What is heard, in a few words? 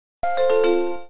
Disconnect; LG KU800 Chocolate; Event sounds